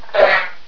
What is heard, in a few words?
headcrab idle; svencoop